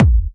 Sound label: Bassdrums